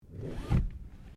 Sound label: Car Toyota Corolla seatbelt pull; 2009 Toyota Corolla hatchback; Cars; Vehicles